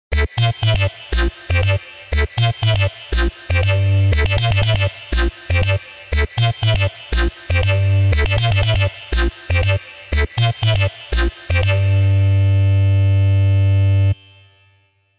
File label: Wavetable Basic